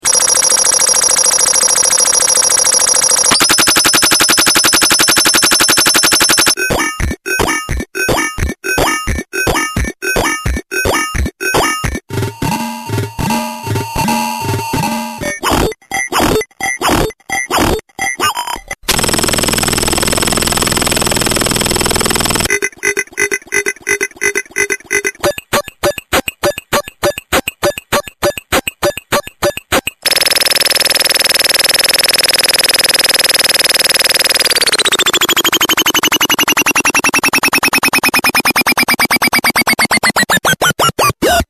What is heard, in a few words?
super glitch loop